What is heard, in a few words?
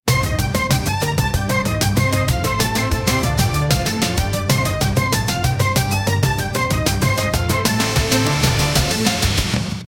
intro police crime, crime; live